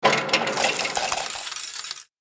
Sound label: Vending machine coins dropping into return; money